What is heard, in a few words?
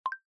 Video Record, ui